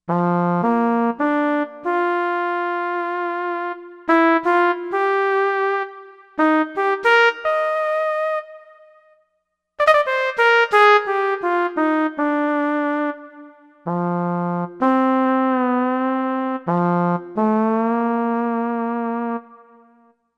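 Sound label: Brass Soft Trombone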